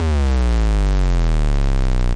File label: nu skool bass; Basses